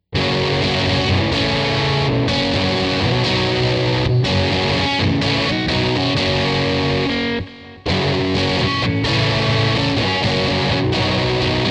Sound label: electric